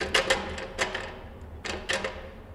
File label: Jail Door Rattle; jail & prison doors